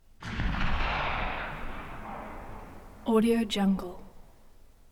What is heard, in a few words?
gun tails; soundmorph